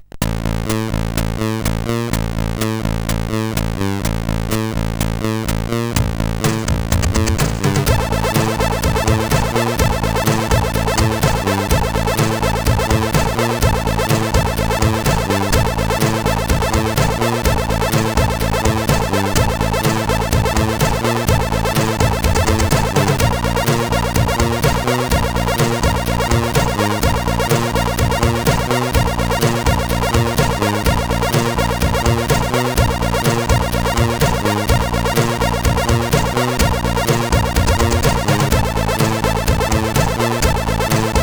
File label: Hardcore, sid CSG8580R5